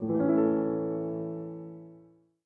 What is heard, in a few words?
Piano Flourish, Classical Harmonies notifications; Google Pixel 4